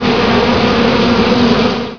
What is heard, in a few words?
beehive; Tanks